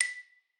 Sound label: xylo